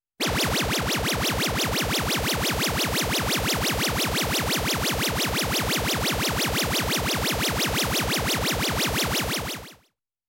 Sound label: Laser Sound